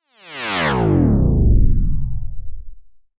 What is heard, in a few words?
Descend Electronic Descend with LFE, Delay